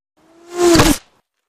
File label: Bullet Impacts Wood I